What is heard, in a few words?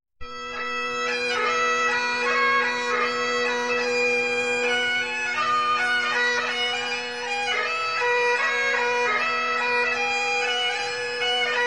Polka, Celtic